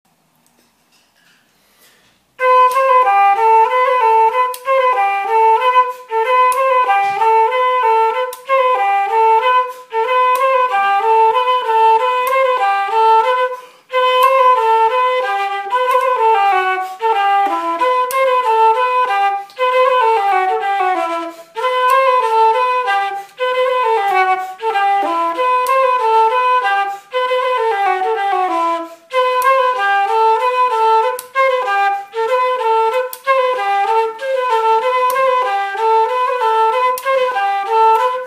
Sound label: celtic tunes